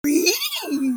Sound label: rehehehehehe